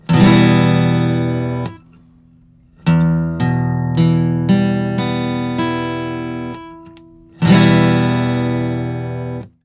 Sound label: Major or Minor